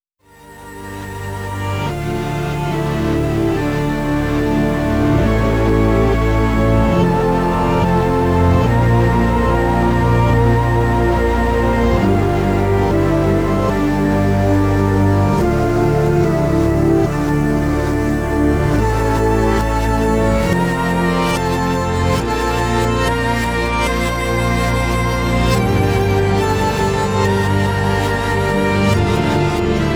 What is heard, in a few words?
Instrumental